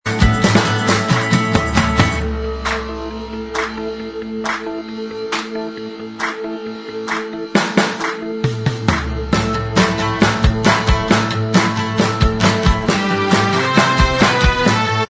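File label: instrumental, backing tracks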